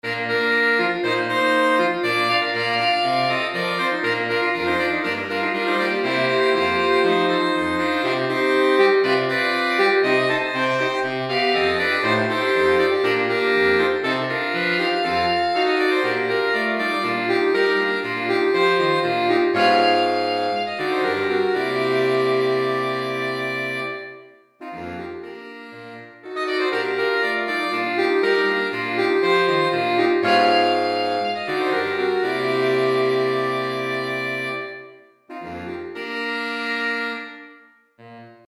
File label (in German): saxophonquintett